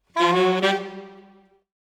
Brass, Jazz for piano

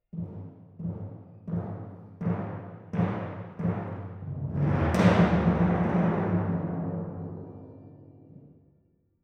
Timp

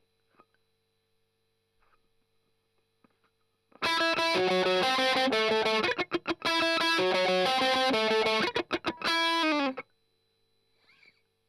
Strum Octav

